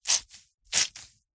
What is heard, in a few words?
foley wet cloth squeeze dab squelch